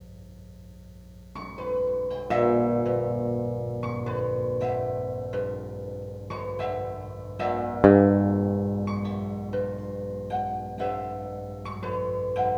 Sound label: pickup test mono bridge harmonics